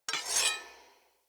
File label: Sword Equip; Weapons; Player; Swords; Fenn Jotar Sword